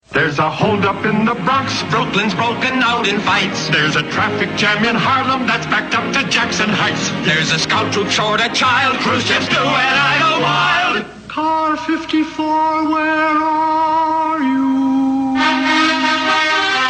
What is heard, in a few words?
theme song; car 54 where are you